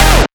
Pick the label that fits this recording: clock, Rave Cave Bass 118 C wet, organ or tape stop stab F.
tape stop stab F